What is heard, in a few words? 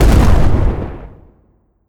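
Kamikaze Explosion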